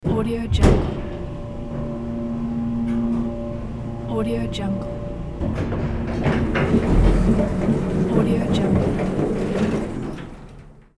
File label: elevator passenger goes down door open; hollywoodedge